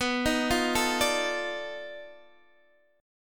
B 7th Sharp 9th Flat 5th, down, Guitar, Open D

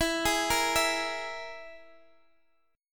E Major Flat 5th E, Guitar, Open G; chord shape

